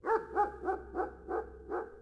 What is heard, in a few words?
dog; Mafie 1